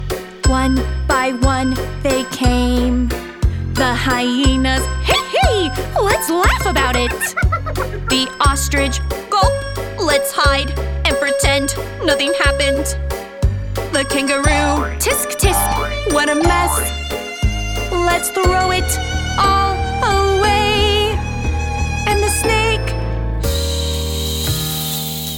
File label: Childrens Song